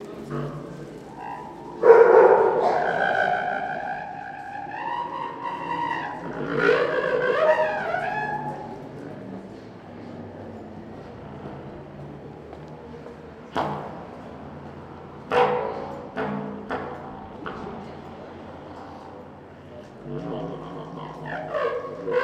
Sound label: tunnel; MarcoSalaDB